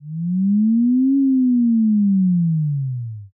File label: whale